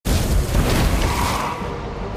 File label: hulk jump